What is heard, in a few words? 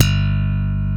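PRE-SLAP G2, bass; Drums In Your FACE!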